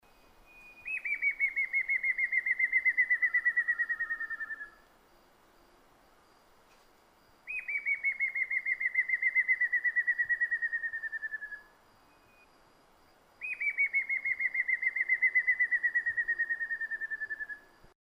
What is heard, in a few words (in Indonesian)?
suara brown headed kingfisher